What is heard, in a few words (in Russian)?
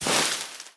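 шуршание запуганного зверька в кустах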